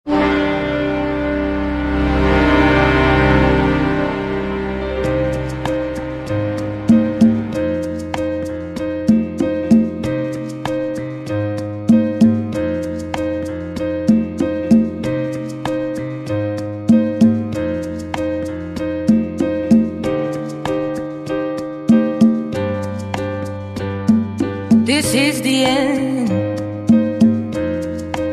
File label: Ballroom Rumba